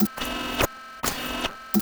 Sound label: CYBER DOOR; Various FX & Atmo; Distored Reality